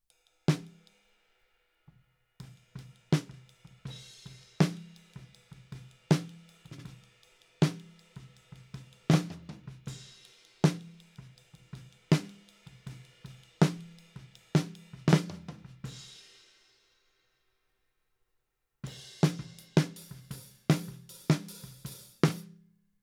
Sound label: Snare Top, 160 BPM